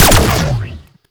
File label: Fire Shot; Duviri; Incarnon Cestra; Weapons